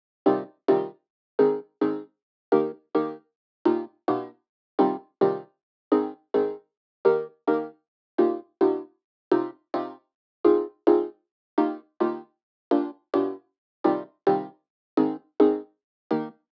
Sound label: 106 synth loop accordion stabs Cmin